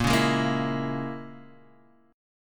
A sharp Minor 7th Flat 5th A sharp, Guitar Half Step Down